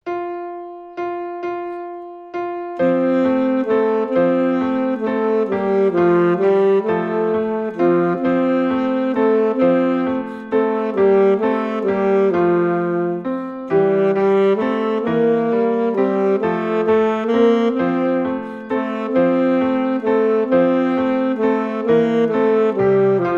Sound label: Suzuki Volume 1 Alto Sax Cuckoo